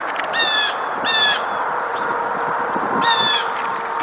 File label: bird